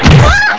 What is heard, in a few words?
BIG HIT; carmdemo